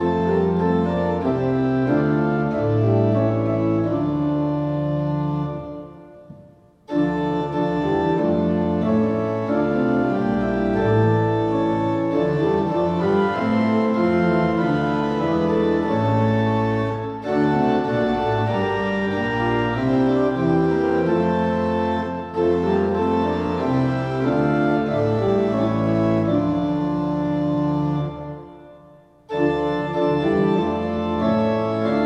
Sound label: Hymn, Aus der Tiefe; Forty Days and Forty Nights; Hymnal 1982